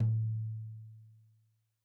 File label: Tom2 Stereo, Deeply Sampled Drums; SMDrum